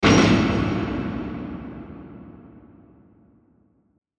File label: Hit Metallic Latch Reverb; Blastwave